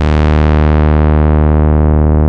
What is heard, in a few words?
TAURUS E2, synthesizer; Hollywood Edge - Giorgio Moroder Rare Synthesizer Collection